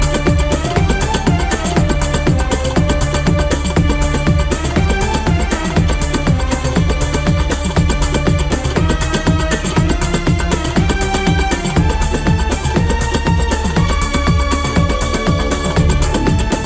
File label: Oriental Music